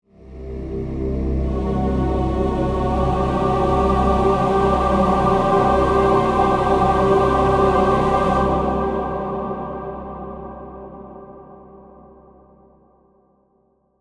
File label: Horror Music; BlastWave